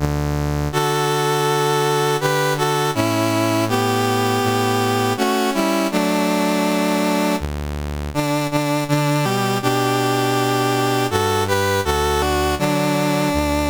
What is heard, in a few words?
Czechoslovakia, National Anthems, CSG8580R5